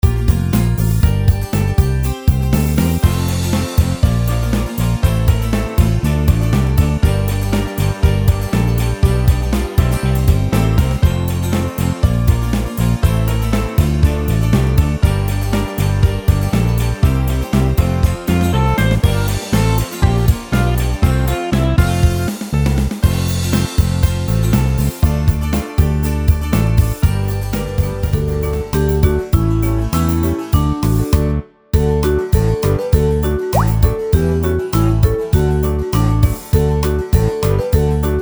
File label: Karaoke